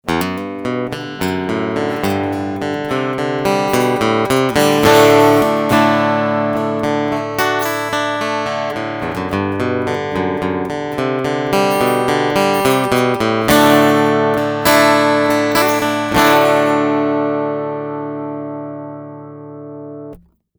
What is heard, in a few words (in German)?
Picking und Strumming